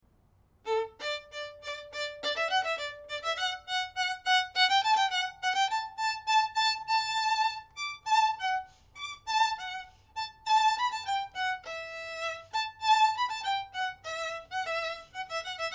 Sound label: la rejouissance fireworks, violin; handel